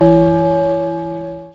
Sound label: gong